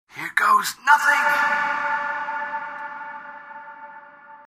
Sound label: SMS; PlanetX